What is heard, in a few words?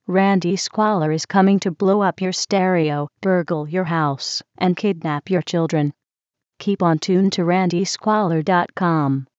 jingle